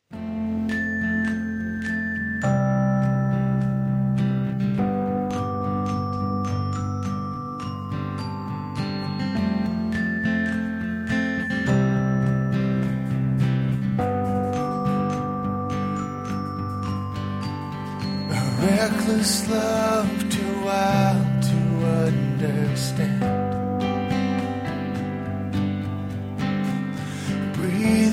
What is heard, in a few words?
acoustic version